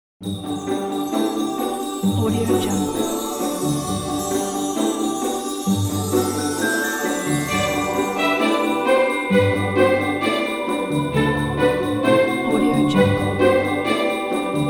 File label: happy christmas pizzicato